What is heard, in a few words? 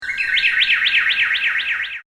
call mail; HTC; Infobar-A02